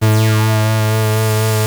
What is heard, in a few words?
OB 19 A3, Rare Synthesizer; Hollywood Edge - Giorgio Moroder Rare Synthesizer Collection; OB 4 VOX.